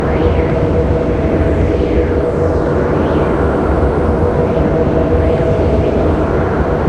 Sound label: nexus power up flame loop; LoL